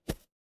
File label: ui toast